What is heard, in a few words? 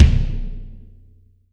BD, Real Mega Drums; Best Service; Real Mega Drums VOL-1